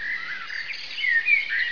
amb hills

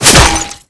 headshot

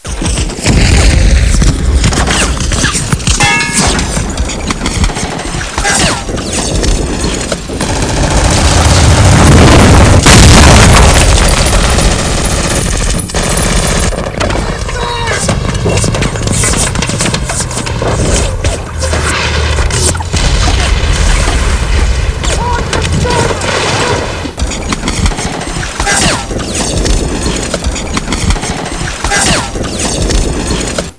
beach

